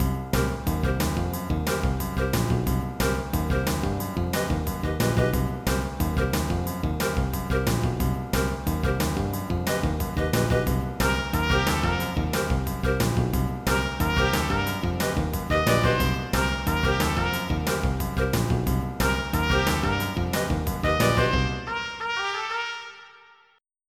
fnky; Presentation Partner

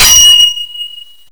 freeze

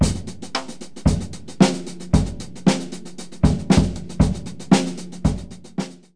drum; animwave

